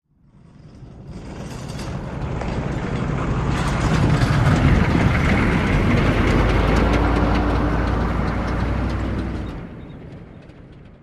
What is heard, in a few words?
Humvee Slow Coast By